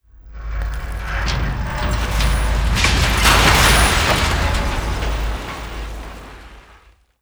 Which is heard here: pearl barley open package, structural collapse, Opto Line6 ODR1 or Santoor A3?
structural collapse